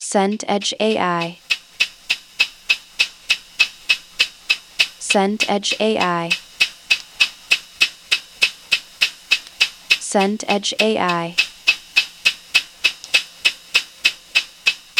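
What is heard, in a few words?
ambience riverside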